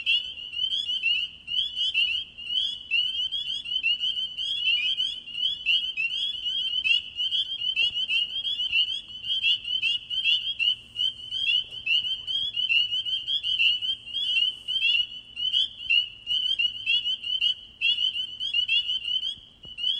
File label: spring peepers